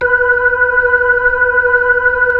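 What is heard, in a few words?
B-3, Hammond B-3 organ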